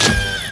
POWER SLAM; Northstar; The Wizard VOL-1

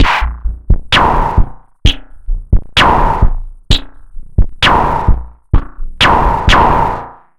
Octave Down Loop 130BPM, DrumLoop Ghost Layers

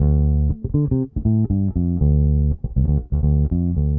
Bass, 70s Analog Funk; Sonic Foundry (Sony Creative Software); What it Is! 70s Analog Funk

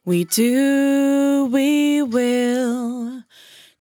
100bpm Bm, Velvet Vocal; Zero-G

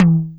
RSF DD Tom, DD-30

